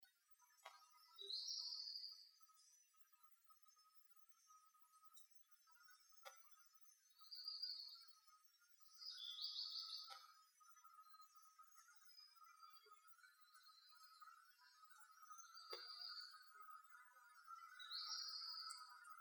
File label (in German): Lichtung Soundaufnahme Botanischer Garten Marburg